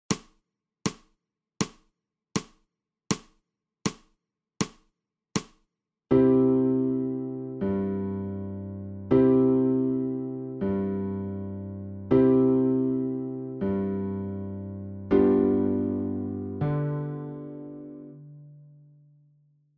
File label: ALTO